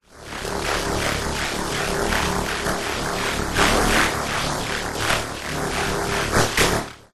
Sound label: science fiction energy power burst; zapsplat